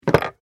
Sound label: Small wooden box container open